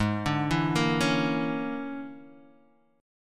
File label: A sharp 7th Sharp 9th Flat 5th, guitar chord; 4,5,2,3,2,x